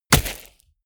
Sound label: body fall sand hard; krieg-waffen; nahkampf; soundpool